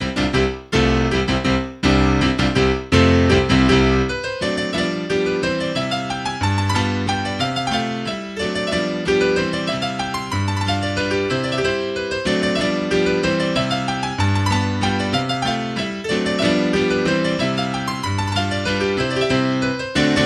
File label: Mazurka in C Major Vivace